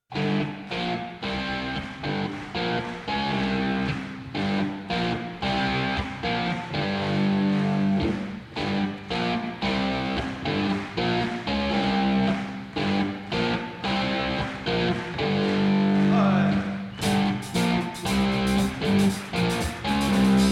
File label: rock